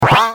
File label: jump; cookie run